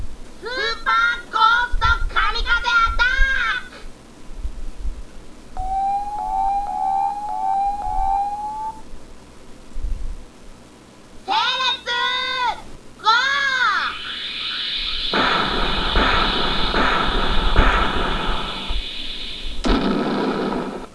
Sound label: ghost; Ghost attack; DBZ